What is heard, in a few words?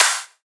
Dubstep Clap; Claps; Ghosthack; Dubstep Sound Arsenal